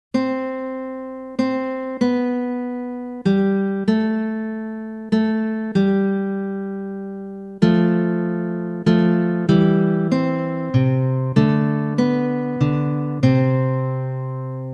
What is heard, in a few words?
Guitar